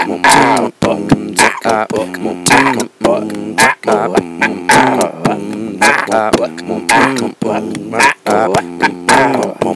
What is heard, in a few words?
beatbox